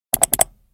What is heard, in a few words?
Notification Tone; Whatsapp